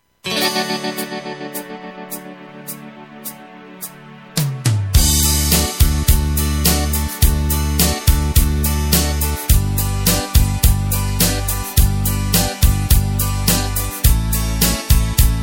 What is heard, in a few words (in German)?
Tromp Playback; trompete solo; midifile